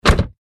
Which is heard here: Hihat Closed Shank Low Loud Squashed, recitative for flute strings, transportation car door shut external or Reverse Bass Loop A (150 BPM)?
transportation car door shut external